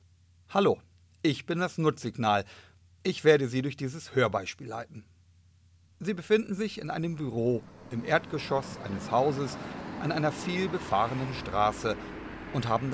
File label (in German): Auralisationsbeispiel Verkehr